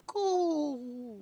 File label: cool medium